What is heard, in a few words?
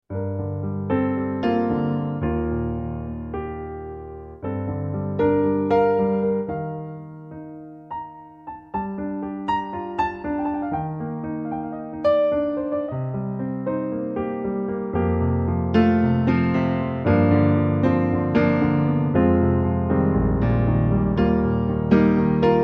Concert Piano